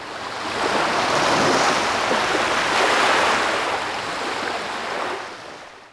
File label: wave lapping; DAoC